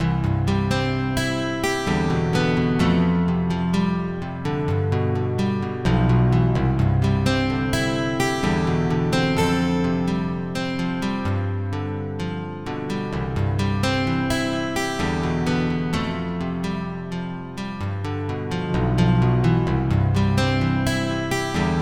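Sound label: guitar solo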